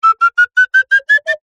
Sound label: cartoon slide whistle ascend stutter slow